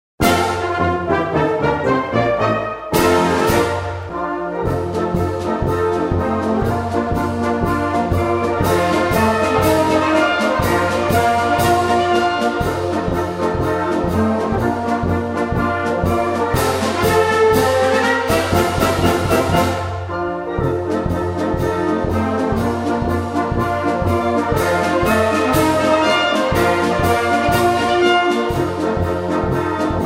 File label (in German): Freiheitsglocken